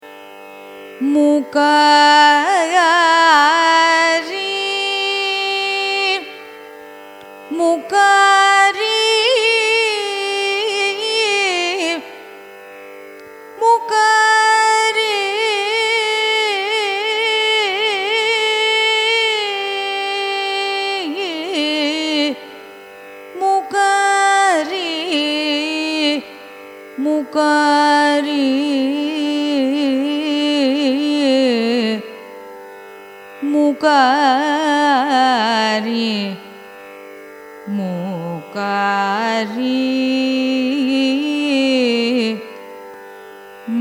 raga mukhari signature, carnatic music

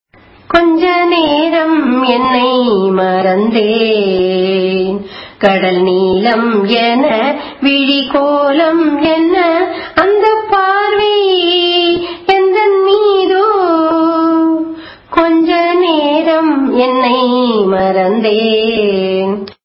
raga saranga cine; carnatic music

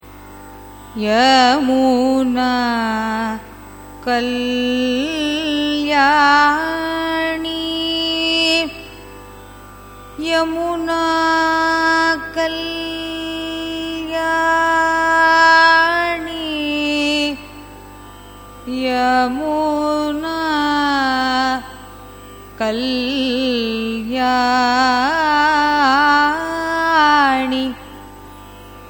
raga yamuna kalyani signature, carnatic music

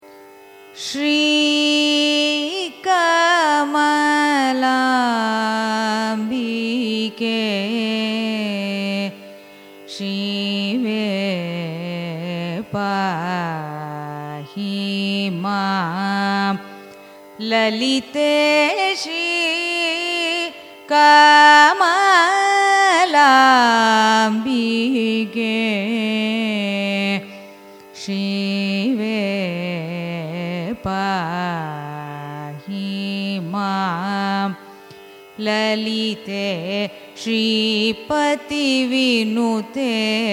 raga shri ragam carnatic; carnatic music

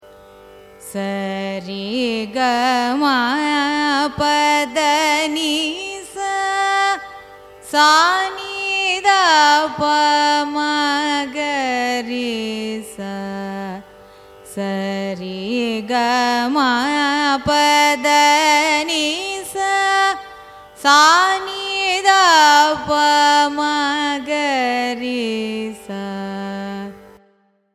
raga lathangi arohanam avarohanam; carnatic music

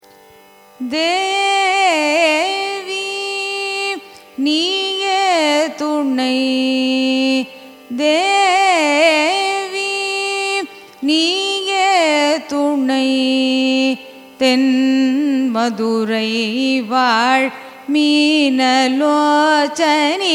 raga keeravani carnatic; carnatic music

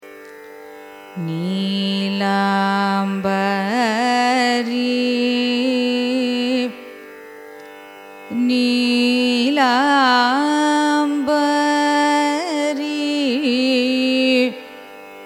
raga neelambari signature, carnatic music

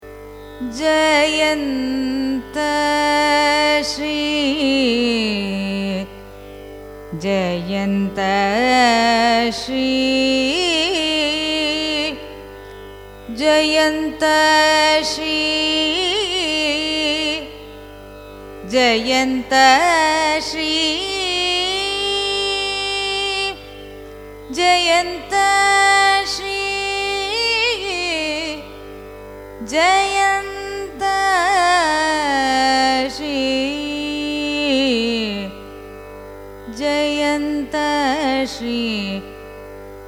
raga jayanthashri signature; carnatic music

